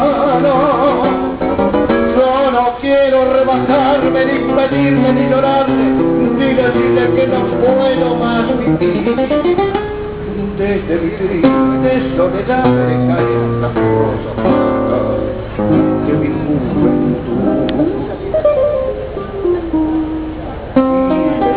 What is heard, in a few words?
tango singer; Argentina; BuenosAires; LaBoca; TangoDancers